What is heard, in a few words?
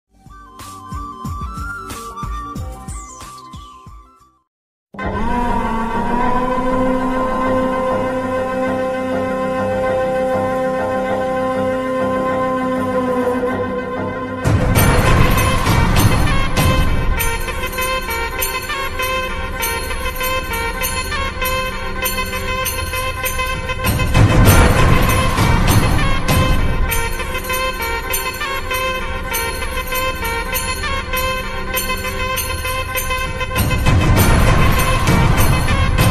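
villain background music; pechi; sandakozhi; pandhemkodi; varalaxmi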